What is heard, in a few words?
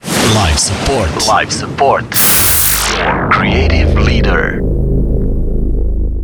Creative solo vocal FX; livesupport; jingles